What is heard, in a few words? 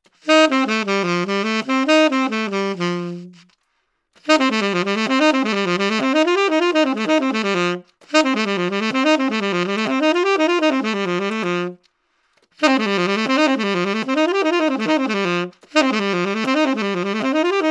Middle D; BSS